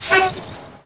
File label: shops bus move; Tony Hawk's Project 8; XBOX